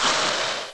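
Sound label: pulverize; ICEBREAK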